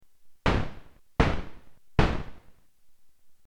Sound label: Gunshot